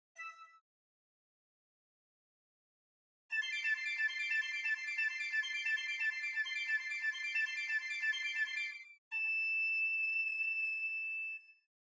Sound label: Piccolo